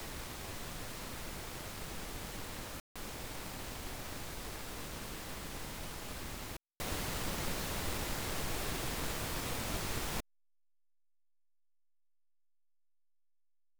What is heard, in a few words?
Left Right In Phase Out Phase; Audio Quality Immersion Examples